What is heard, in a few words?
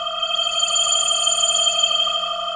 satellite ambi